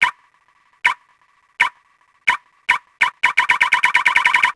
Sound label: warning beep; Max Payne